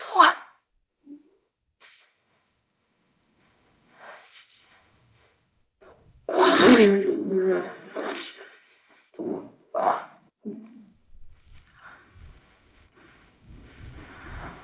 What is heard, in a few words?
jingle